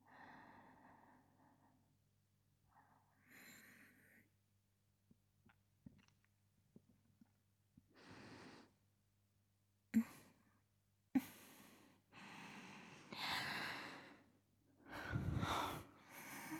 寝息; SEなし

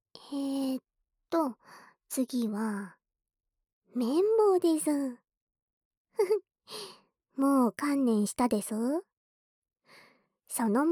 綿棒, 左, ASMR; (元右)

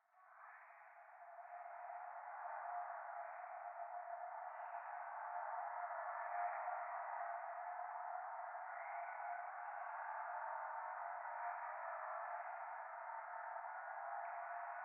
廃墟 右耳密着吐息 車の中

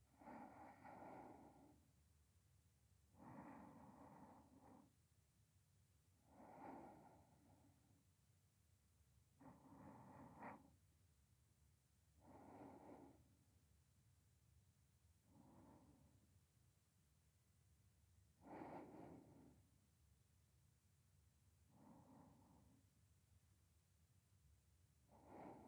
添い寝 寝息 ボイス

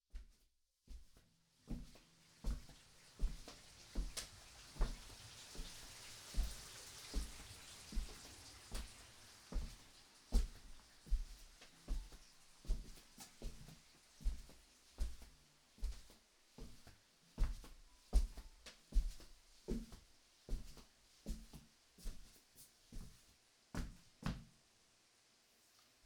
お風呂上がりのドライヤー

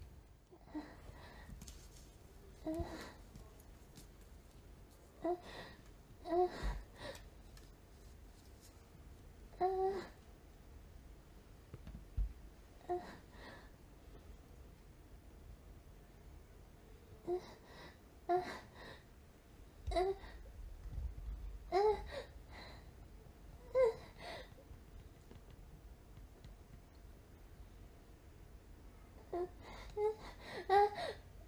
OLの卑猥なオナニーボイス, マンコのヌチャヌチャ音; マンコのヌチャヌチャ音が聞こえるOLの卑猥なオナニーボイス

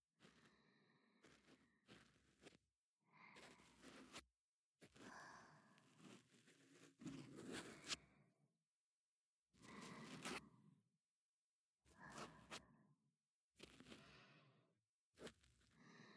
耳かき 左; 小夏お姉さん; 寝息&吐息ループ音声

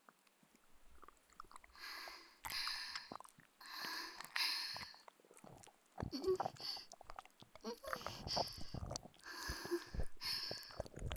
耳舐めされながら、オナニーするどすけべ幽霊に乳首舐め・手コキ・フェラされる音声

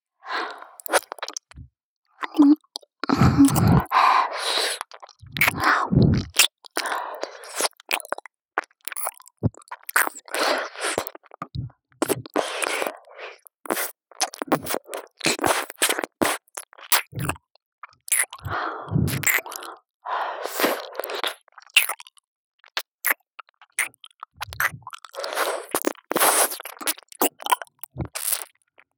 友達みたいな彼女の左耳舐め (ループ); SEあり

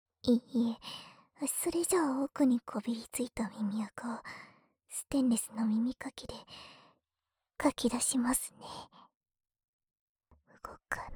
右 奥耳かきゆっくり, ASMR

